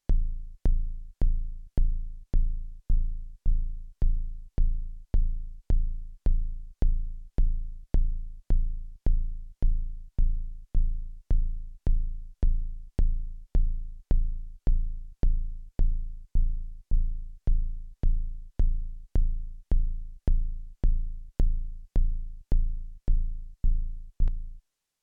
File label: Volca kick lowest no drive